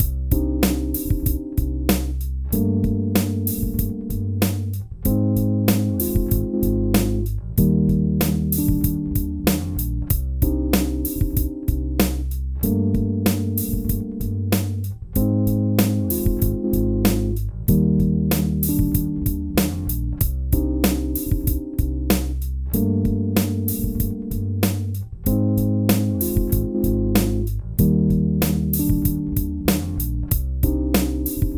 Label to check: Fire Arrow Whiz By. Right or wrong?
Wrong